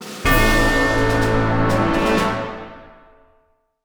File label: Defeat; Hyper Knight Battles